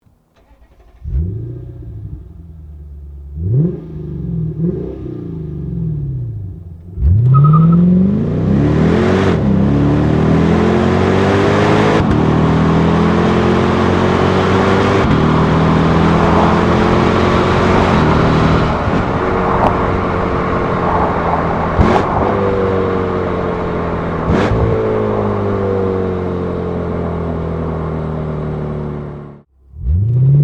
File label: Lexus IS F